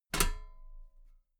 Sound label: metal ladder step regular clank